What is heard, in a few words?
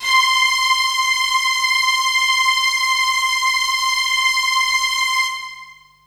Synths HiString C; Vengeance Samples